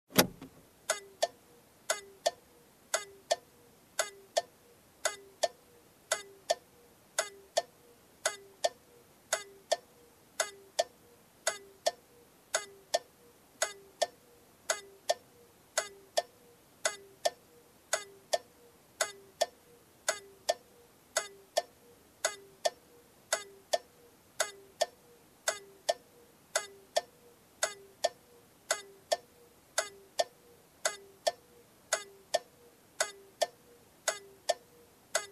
Car blinker from inside

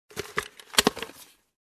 Sewing Wooden Tool Box close open

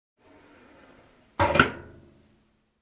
Closing small metal box

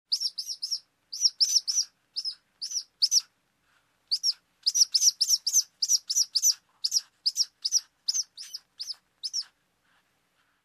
Hatchling chirping and singing

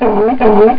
Barking sea lion sound

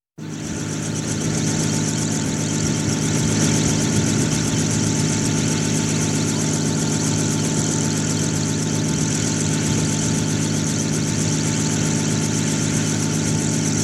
Helicopter on Helipad Waiting

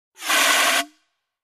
Fire extinguisher Sound

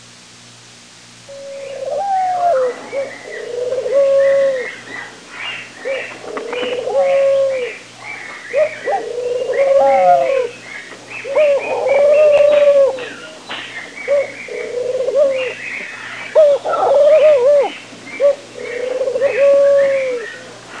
Dove flock sounds

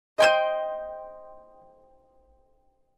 High minor chord strum on harp

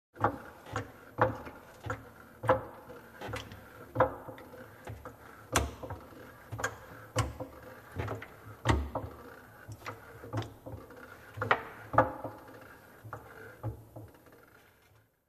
Tightening Huge Machine Gears

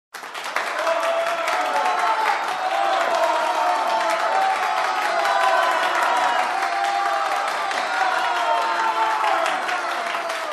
Crowd applauding and motivating

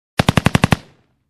Short heavy machine gun fire

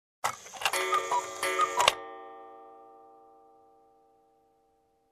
Cuckoo Clock Alarm Sound short

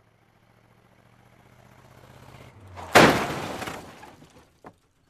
Car Crash on a Wood Surface

